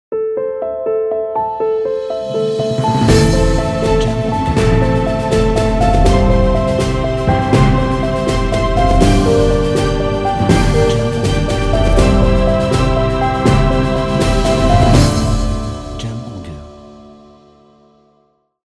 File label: Cinematic Logo Intro